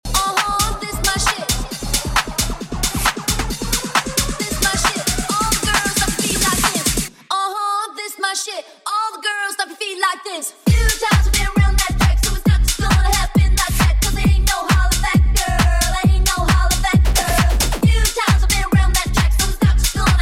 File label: Disco Arab; Flowjam Live